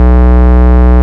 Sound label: Root B, Ultimate Bass; Vengeance Samples